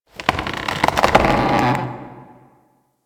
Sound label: Hub Tree Creak; Warframe; Tenno